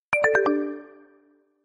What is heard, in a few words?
SMS; 鳴沢; マイファミリー